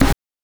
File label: BANK1 SD, NES DPCM; MIDINES